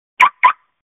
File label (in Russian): zvuk snyatiya